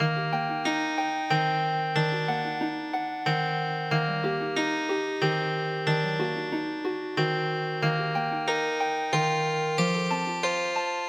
Healing Harp

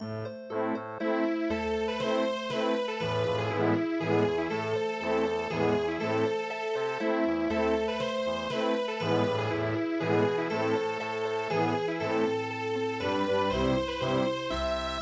vienna waltz; Her Hjemme

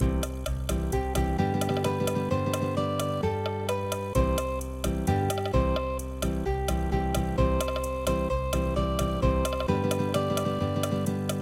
Spanish Guitar flamenco

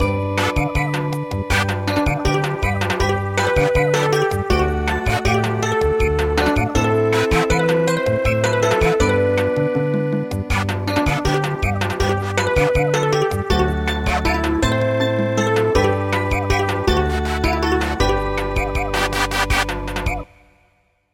Samba

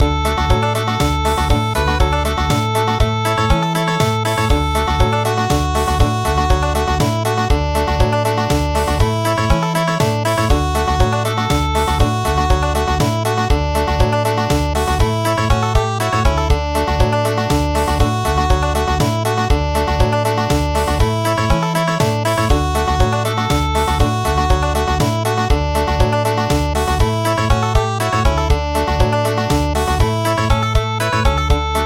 Country; Hambani kahle

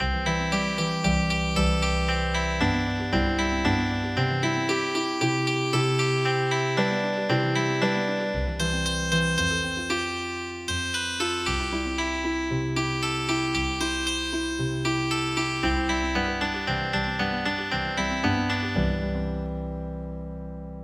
Healing Harp, traditional

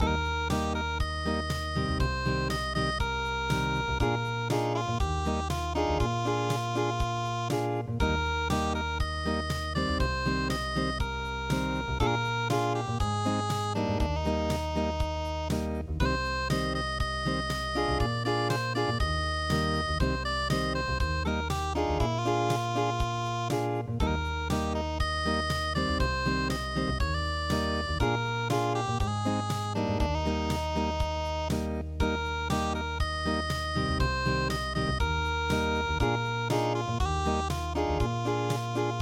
Dreaming Of Home And Mother unpluged

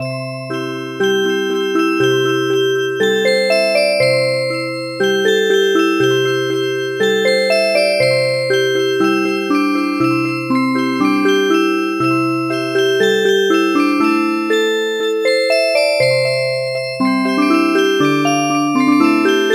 Music Box; Candle Dance

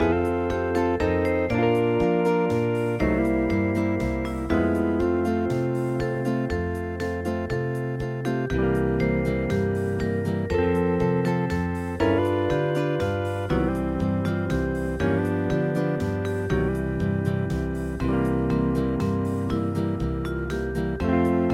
hawaiian; HAWAII PONOI

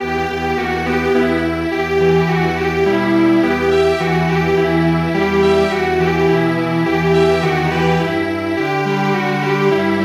Strings Quartet; Carol of the Bells

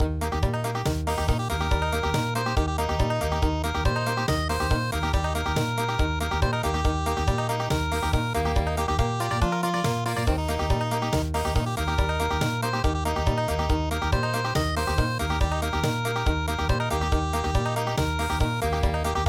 Country; Barbara Allen

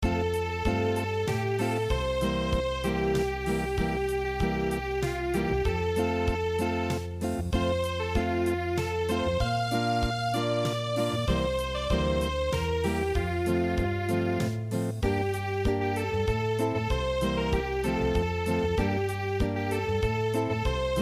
Zephye Of nightfall Unplugged

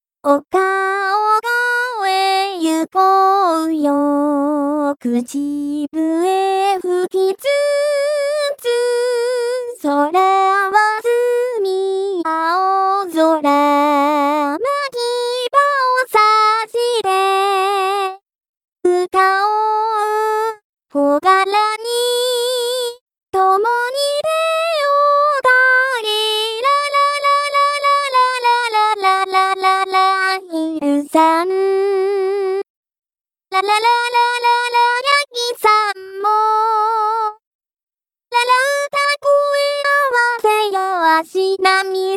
Picnic female, vocal only

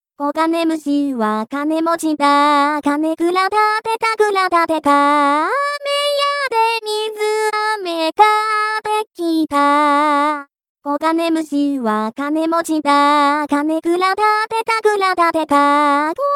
vocal

